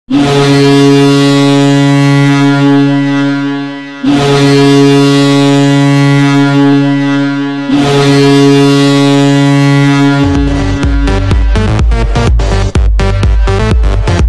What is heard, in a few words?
goal horn; Florian Eura